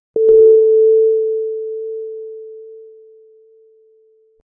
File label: fantasy pickup